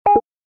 button

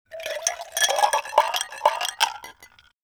water cup

